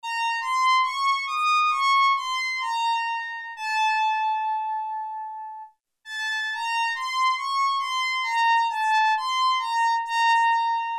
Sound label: SOLO STRINGS, Oberheim OB-8; Factory Patches